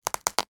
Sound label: horror body part tear rip dislocate; sound effects one